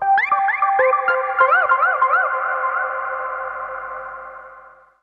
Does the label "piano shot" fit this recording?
Yes